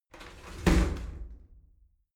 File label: shower slide doors close heavy; objekte; tueren